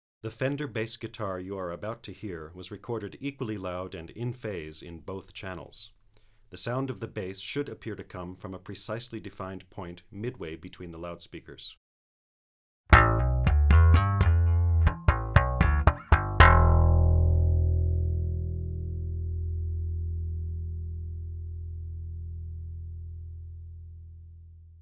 Fender Precision bass w- Rotosound round-wound strings; spoken; Stereophile Test CD 2